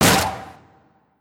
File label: Destroy Death Imp